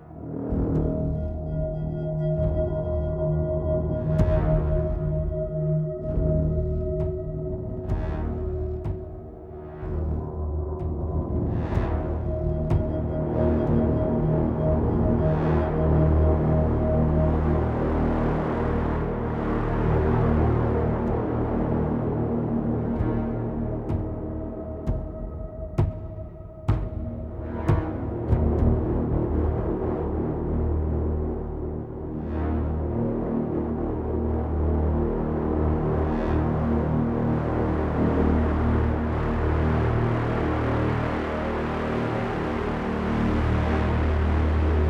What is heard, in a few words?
Default Loading Music; Battlefield 1